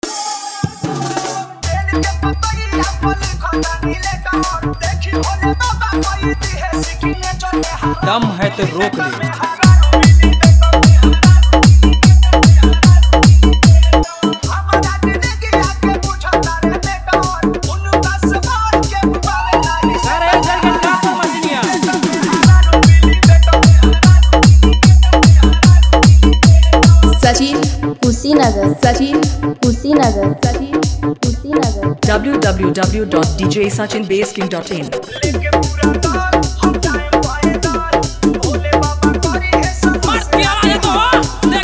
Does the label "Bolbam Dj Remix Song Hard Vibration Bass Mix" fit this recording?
Yes